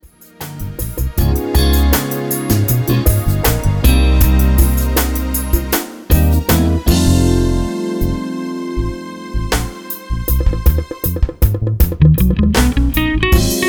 No Trumpet Key Of Eb

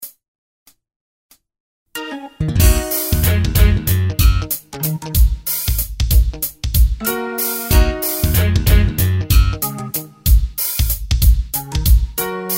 No Sax Key Of Eb minor

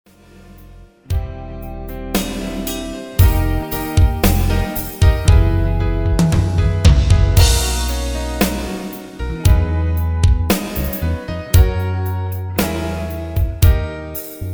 No Sax Ld Gt Key Of B flat, backing tracks

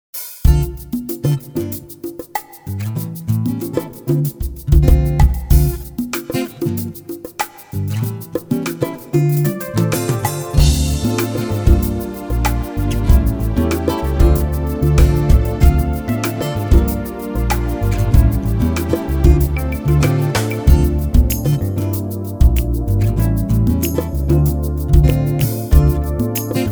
No Sax Key Of D Minor

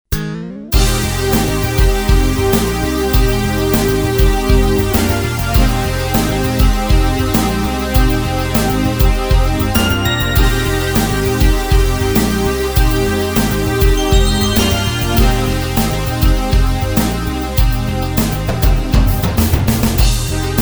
No Sax Key Of C minor

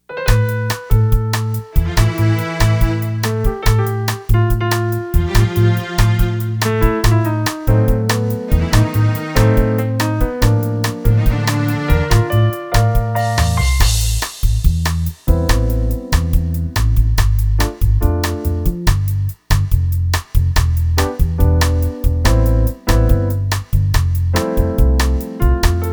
No Sax Key Of A Minor

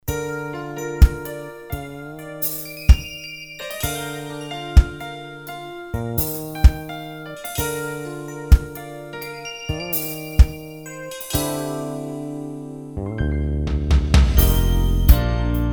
No sax No Vocals key of Bb

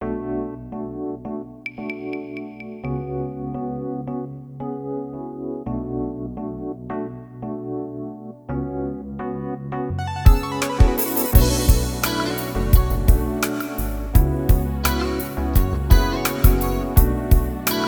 No Glide Synth Guitar Included Key Of B Minor

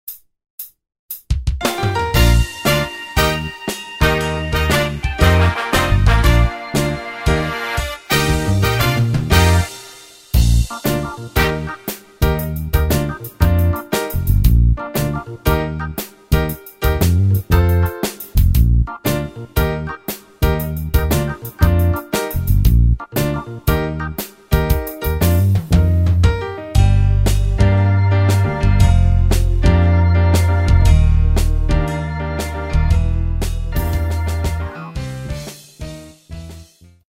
no vocals Key of Bb, backing tracks

